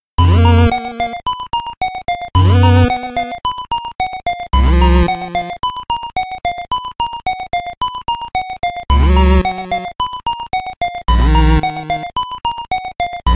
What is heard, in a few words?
BGM Epilogue INTRO 8bit; Mighty No. 9; 8-bit soundtrack